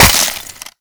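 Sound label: icicle break medium, explosions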